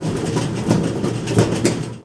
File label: Conveyor Belt; Playable Tutorial Level